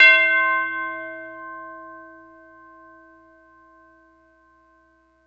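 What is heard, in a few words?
tubular C3, orchestral percussion; Greytsounds VOL-1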